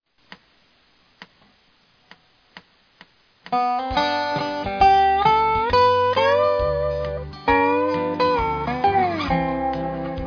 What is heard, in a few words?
slow, dobro